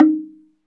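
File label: SLIT DRUM D#4 P; SLIT DRM1; Heart of Africa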